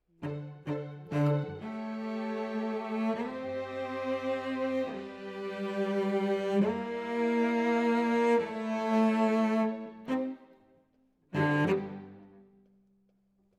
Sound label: Cello Solo